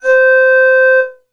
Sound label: E-Mu Proteus FX Ocarina C5